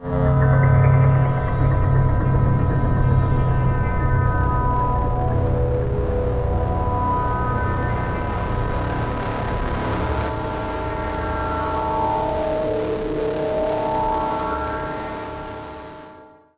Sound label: Synth Effect; Sound FX